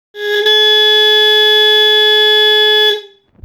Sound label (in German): Glas